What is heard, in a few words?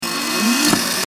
VHS rewind